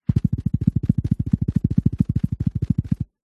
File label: cartoon animal scuttle fast carpet